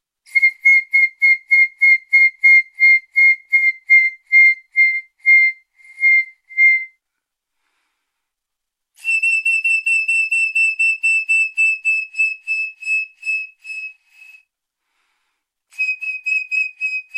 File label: birds